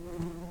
Lapidarius workers, bumblebees

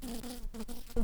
Recording, bumblebees, Sylvarum workers

